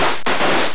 fire crk

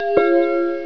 door bell; Focus AudioClock v3.1.1

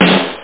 knock; da capo vol1; drums snare

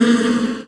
drone; kult

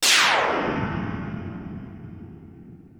DRUM LOOP ambient 160

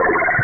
bonus

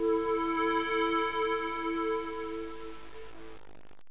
prophet5 ambient sweep, synths; Zoom - Release 2 (1996); Active Software

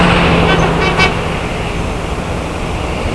Traffic; MOBICLIC; langues

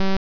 Stall Warn; F⁄A-18 Hornet 2.0

